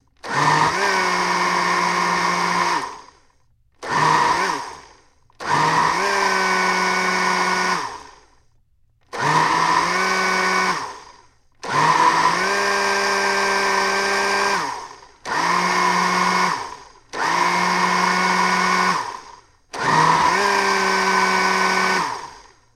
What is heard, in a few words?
Blender Ice Cream